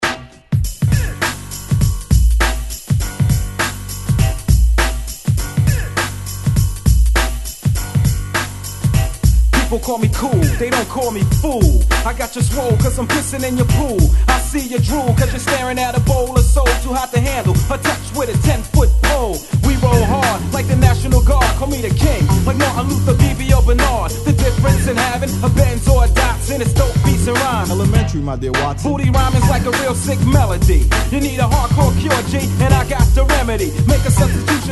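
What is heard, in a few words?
hip hop, Vocal Mix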